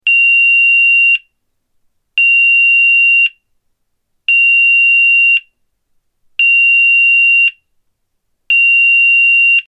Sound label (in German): Ton